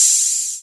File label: MIX HHO, DRUM RYTHM; AKAI S6000 CD-ROM - Volume 1